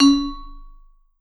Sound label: C#3, VIBE; Sampleheads - Dave Samuels Marimba & Vibes